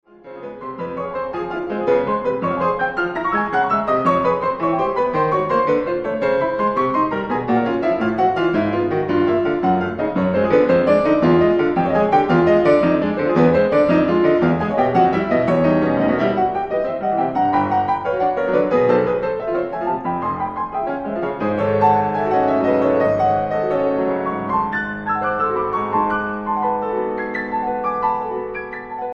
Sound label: Preludes for piano, Allegro Vivo